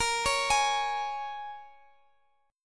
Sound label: Bb Minor 7th Bb, Guitar, DADGAD; Bbm7 arpeggiated